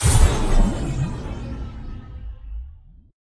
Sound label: Slow Spell More Powerful